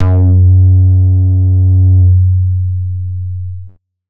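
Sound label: 90s club bass F sharp 3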